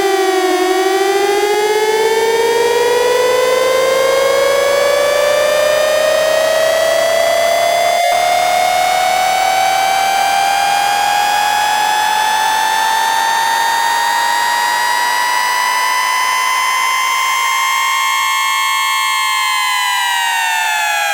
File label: supersquare